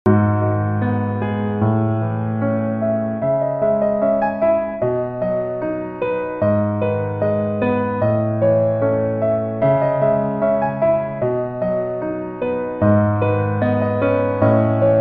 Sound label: instrumental tune